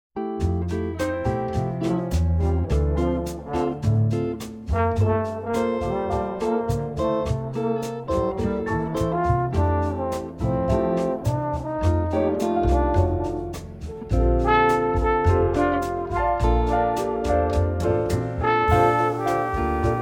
Calm Trumpet

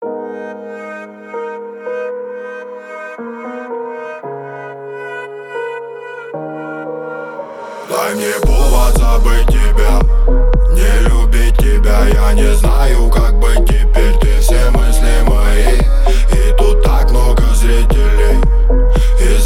37 39 41hz Low bass

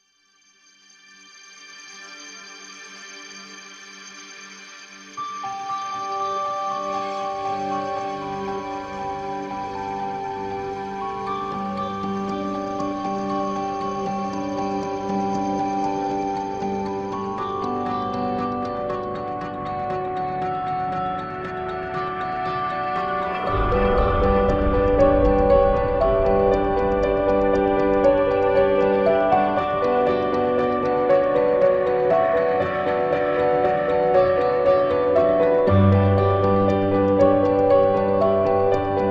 Emotional Drama Piano

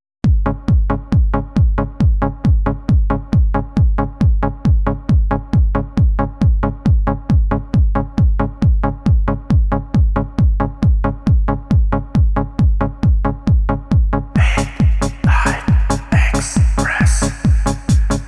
Trance; Hard Trance

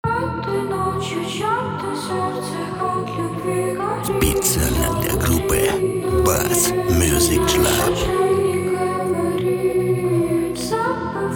Low Bass

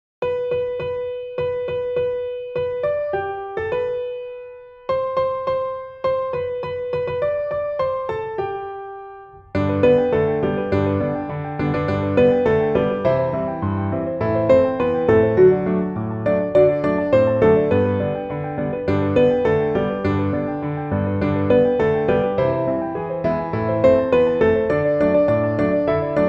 Jingle Bells

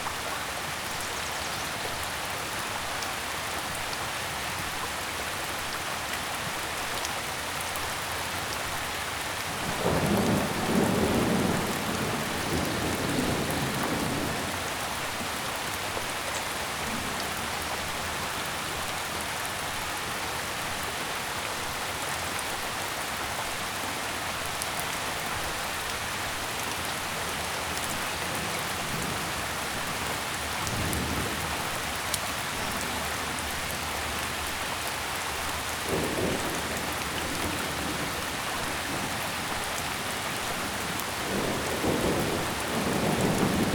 Healing Rain

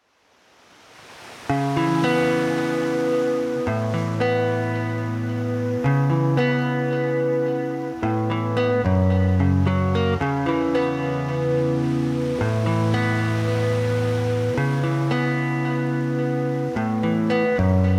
Guitar and Sea

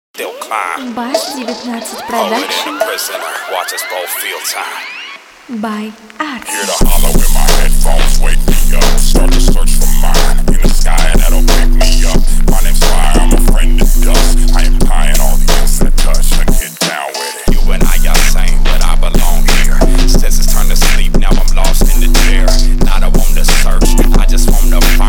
23-28Hz Bass groove

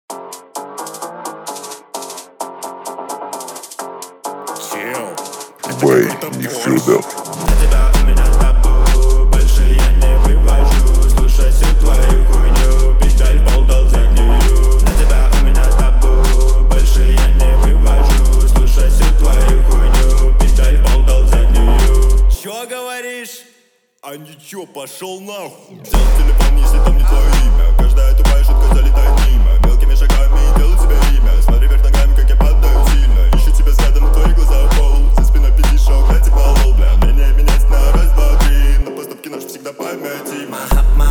41-46hz Low Bass

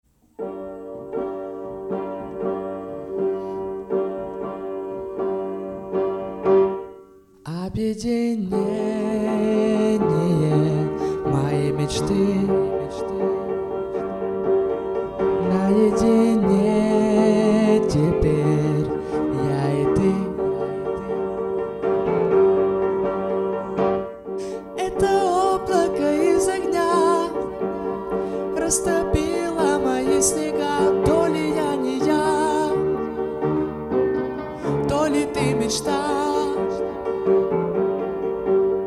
piano cover

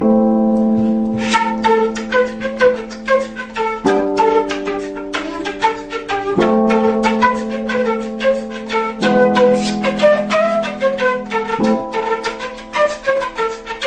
Piano Flute Beatbox

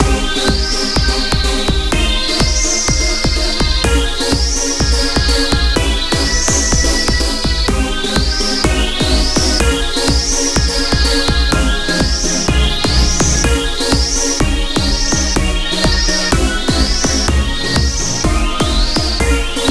Swing

